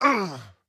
pain; STALKER; IWP

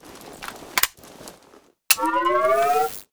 gauss comp misfire, weapons

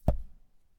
Shell 39mm sand; STALKER; IWP

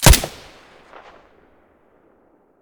silencer mono, weapons

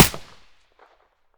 aps silencer mono; STALKER; IWP

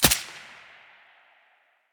silencer mono

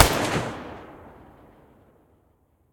muzzle protecta mono distant, weapons

